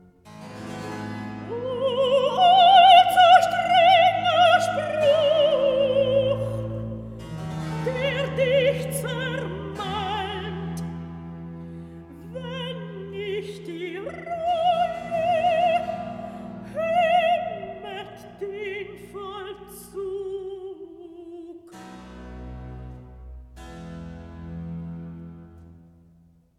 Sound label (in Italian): Recitativo; Nitocris; O du allzu strenger Spruch; Handel Masterworks; Klassika